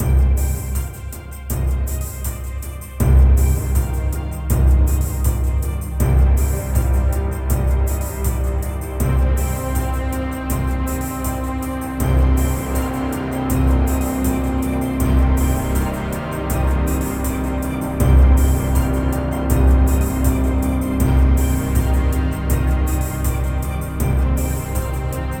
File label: Line Of Drums